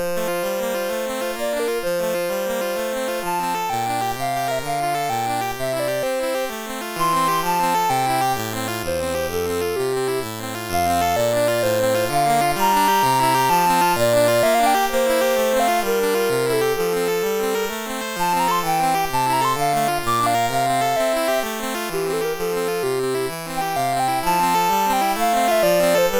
Flute Sonata in A Minor, MOS6581R3; Flute Sonata No7 in A Minor Pt3

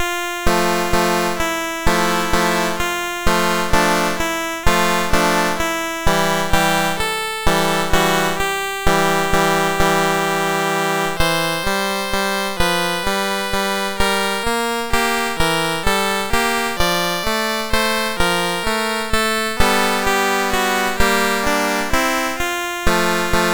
Sleeping Beauty Waltz, MOS6581R4

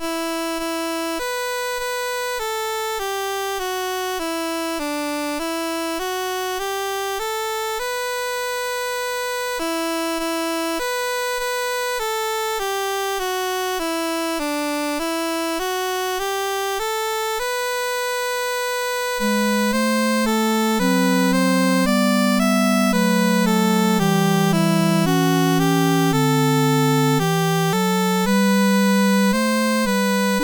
CSG8580; CSG8580R5

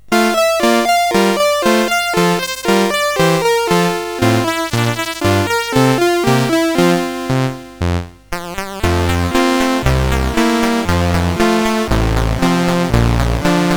Song Sergeant and Chorus Police, CSG8580R5; Pirates of Penzance

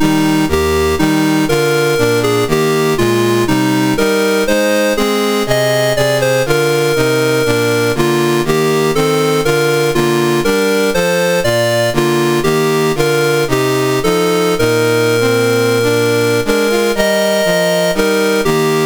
Newfoundland, National Anthems, MOS 6581

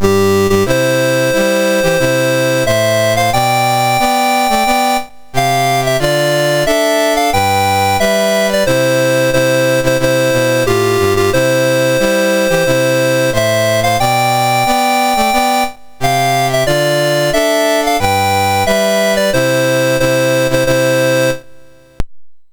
Kuwait, National Anthems, MOS 6581

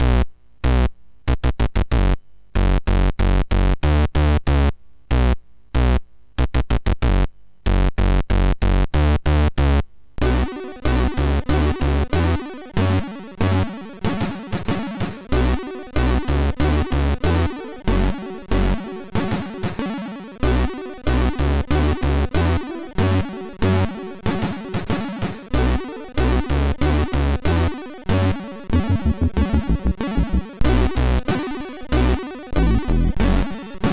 Diva, MOS6581R4

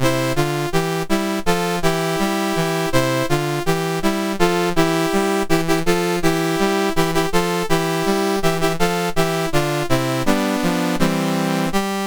Hallelujah Chorus, MOS6581R3

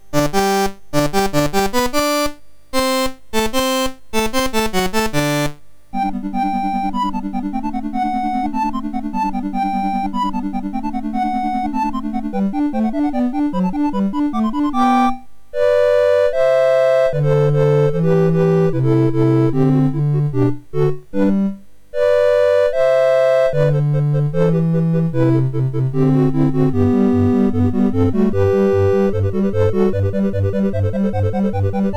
Musik, MOS6581R3

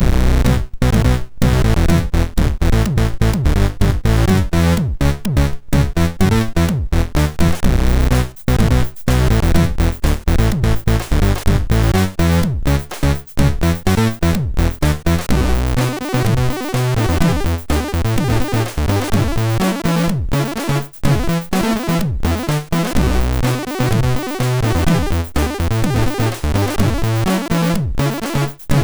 Smog Techno, MOS6581R3

